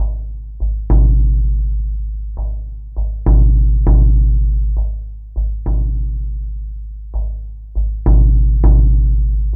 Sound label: Jug Low Pulse